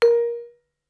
Vibraphone dampen ff Bb4 stereo; MIS Pitches - 2014; Percussion

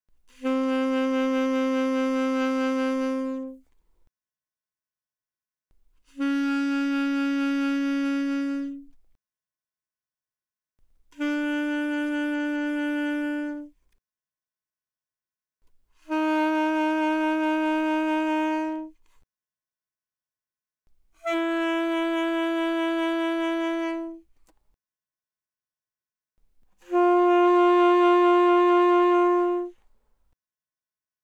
Alto Sax Vib mf C4 B4, alto saxophone

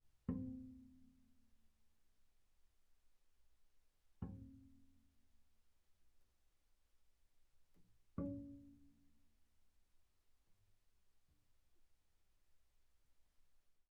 Bass pizz sul D pp C4 D4, double bass

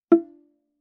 Viola pizz ff sul C F4; MIS Pitches - 2014; Strings

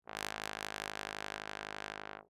Bass Trombone ff Ab1; MIS Pitches - 2014; Brass

